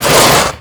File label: Foley Slide; Warframe; Tyl Regor